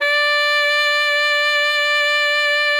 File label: D5, ALTOSAX; Xsample VOL-2; 90 s Sample CDs